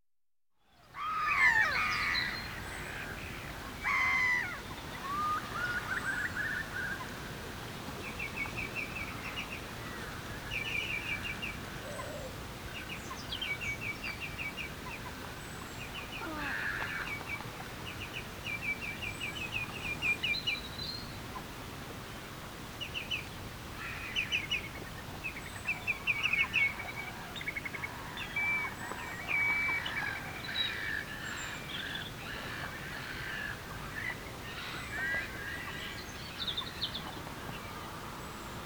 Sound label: Birds Curlews, Oystercatchers; Birds, Insects & Animals